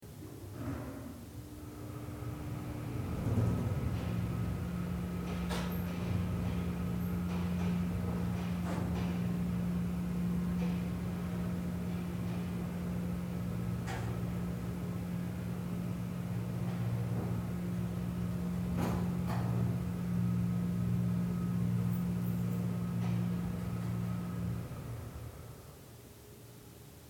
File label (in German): Industriefahrstuhl